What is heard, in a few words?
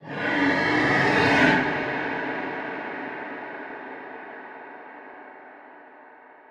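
ambience unknown creatures big snake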